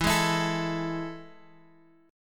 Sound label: E Major Add 11th E, chord; E Add11 strummed; JGuitar